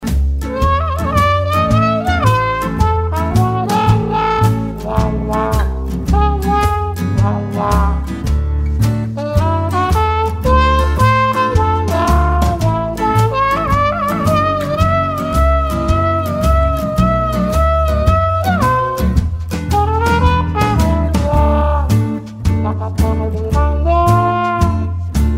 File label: trumpet solo